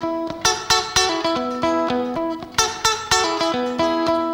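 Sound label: TOWNSHIP, S. AFR. GTR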